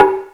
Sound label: Yamaha Tg33, Drums